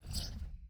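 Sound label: environment building sold whoosh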